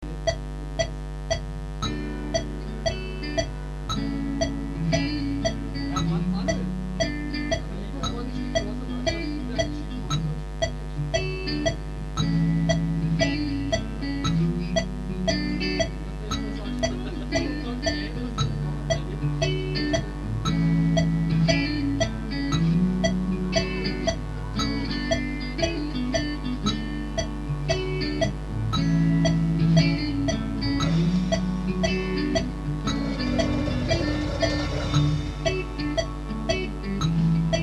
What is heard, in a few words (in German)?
ohne Schlagzeug 116